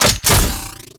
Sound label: Deployable Cover Open; Warframe; Grineer Deployable Cover